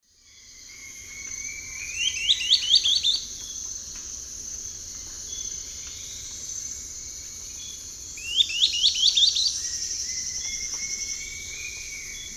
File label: Glyphorynchus spirurus, song; S. America; Eastern Lowlands